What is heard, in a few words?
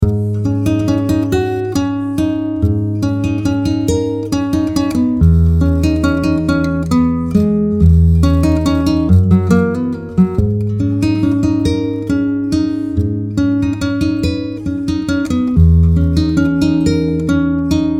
Asian Classic guitar